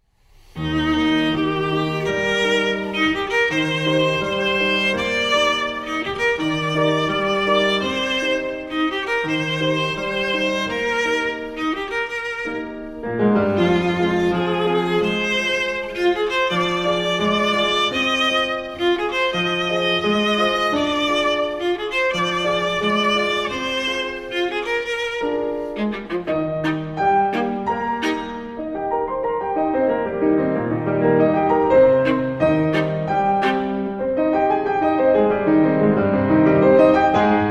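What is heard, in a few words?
viola sonata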